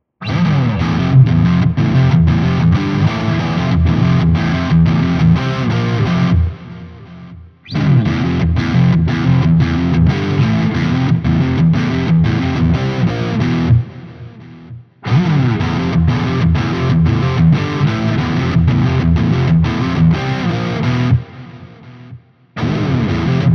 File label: GTRS high gain riff all positions